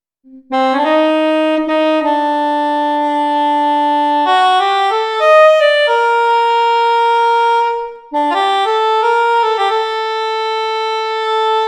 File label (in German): Sopransaxofon; Travel Sax